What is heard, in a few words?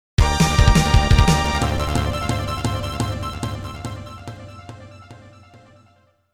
GameOver